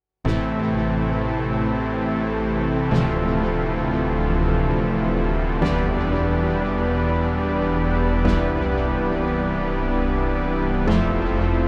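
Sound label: Moog Synth Stereo; COLOR COPY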